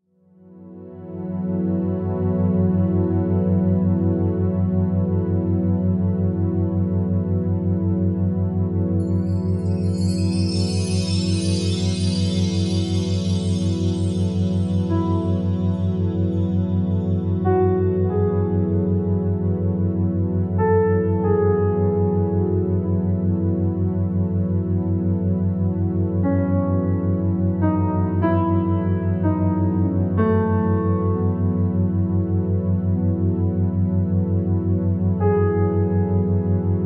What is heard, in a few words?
beta binaural beats healing music